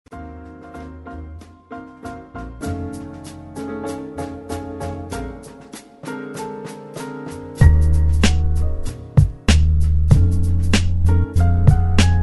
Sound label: Beats